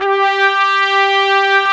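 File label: Orchestra Brass, Roland; Club-50 - Foundations Roland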